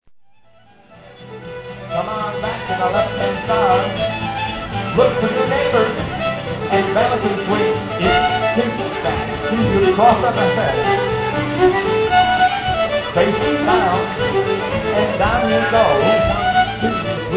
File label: Jig; CFF Bite Woods Hole